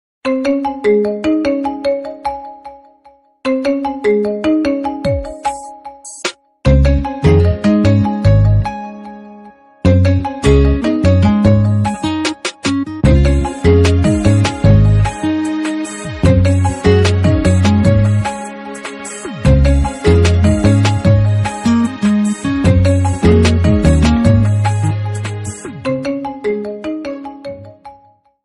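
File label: lenovo, ringtone; ringtony vseh telefonov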